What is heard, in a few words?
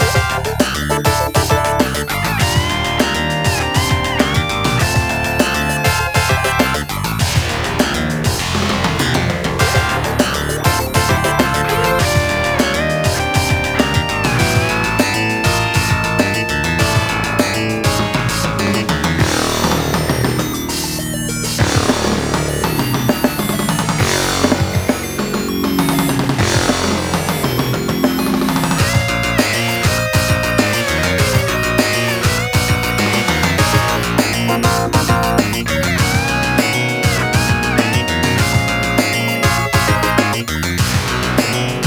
Elevator Loop; Deathwish Enforcers; PS4, PS5, Switch